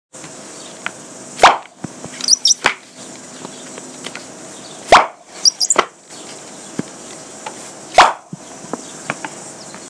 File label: homemade pop gun sound